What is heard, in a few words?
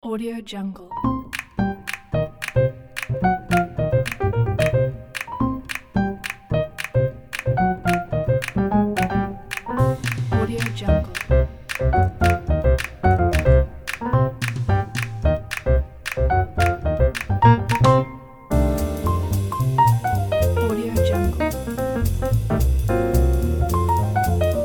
jazz